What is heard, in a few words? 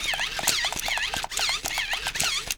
Swift Movement Robot